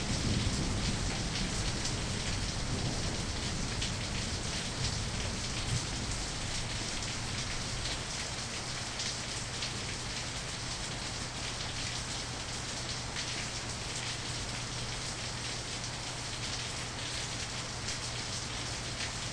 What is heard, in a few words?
rain outside